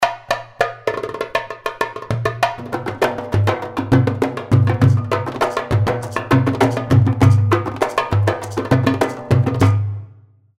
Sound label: Jirk